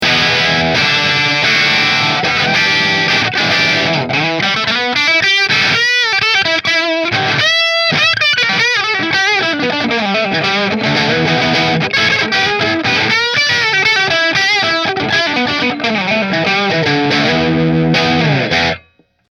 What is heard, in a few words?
Gibson 335 Bridge Marshall